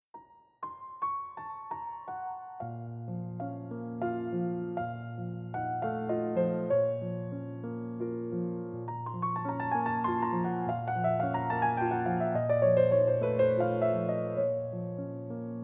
Nocturne in B-Flat Minor Op. 9 No. 1, Piano

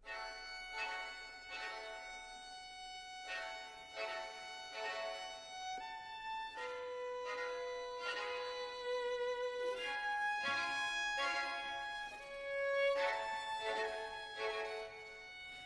Violin Concerto in G Minor; Vivaldi; The Four Seasons; Op. 8 No. 2, RV 315; Summer; II. Adagio

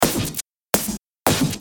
Snares

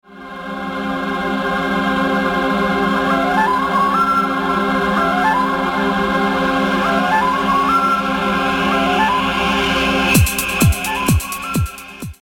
Flute

